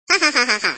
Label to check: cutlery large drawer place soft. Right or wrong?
Wrong